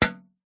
vehicles bicycle pushbike metal mudguard hit impact clunk soft; zapsplat